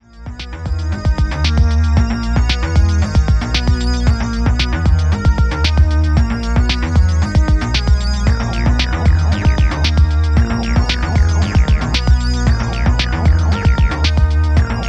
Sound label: Synths